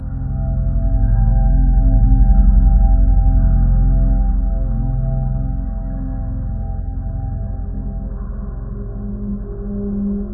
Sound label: amb lab; Monument Valley